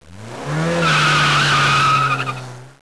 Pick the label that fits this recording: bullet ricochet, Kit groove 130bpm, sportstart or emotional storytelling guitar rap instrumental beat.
sportstart